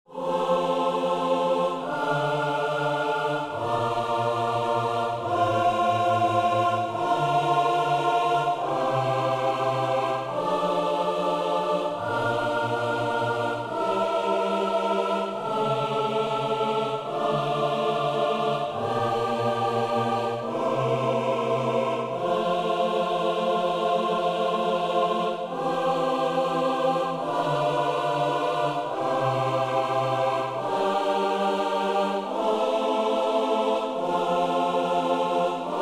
Unfigured Bass Practice

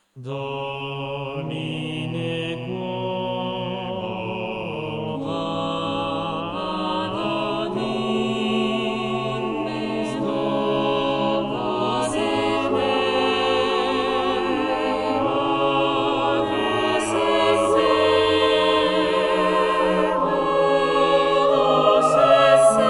Canon a 3 in C-sharp minor; Domine Quo Vadis

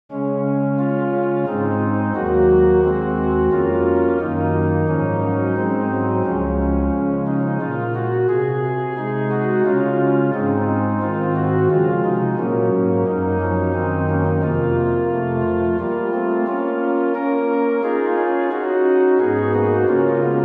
chorale in d flat major

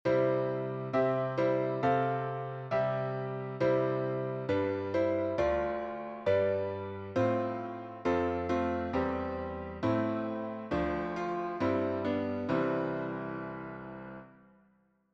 four part writing example

